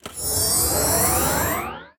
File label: bonus; Alawar; Crusaders Of Space 2